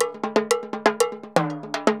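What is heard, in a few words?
TIMBALETA, salsa drums and percussion; Best Service ProSamples vol.14; AKAI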